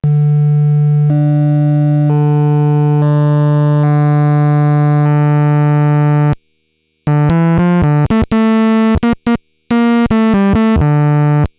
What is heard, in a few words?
six harmonics plus melody